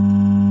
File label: lamp sodium loop